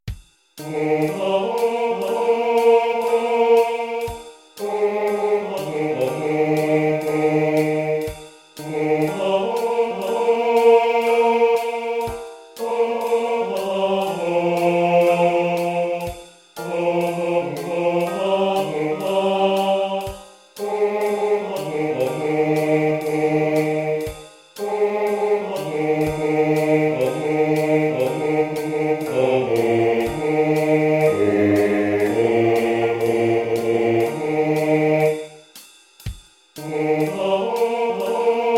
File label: swing bass